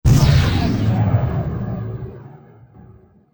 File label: Hit Shield, Explosion; NanoFXv2; ExplosionFX; ExpShield